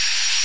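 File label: engine turnover; on the green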